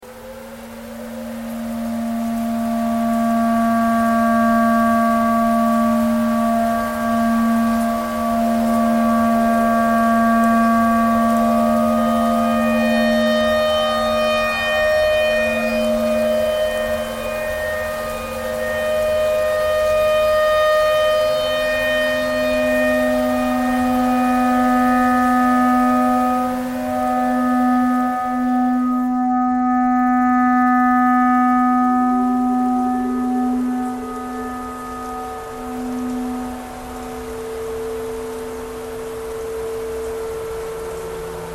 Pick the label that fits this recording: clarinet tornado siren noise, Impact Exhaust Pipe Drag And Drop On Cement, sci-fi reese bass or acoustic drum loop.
clarinet tornado siren noise